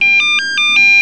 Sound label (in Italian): squillo